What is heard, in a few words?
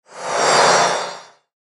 Whoosh Low Airy Shimmer; Blastwave; Whoosh Sound Effects